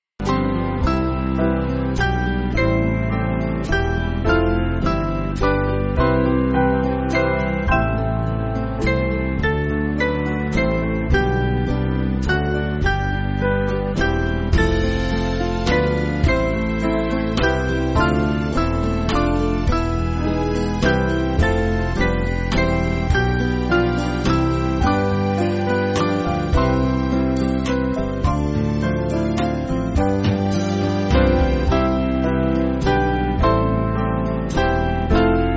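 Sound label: Band, church backing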